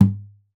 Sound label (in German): KLOPF, GUITAR FX; Xsample VOL-2